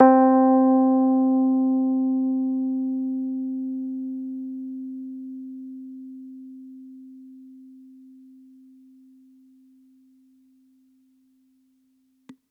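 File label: rhodes; ESW Floom V.1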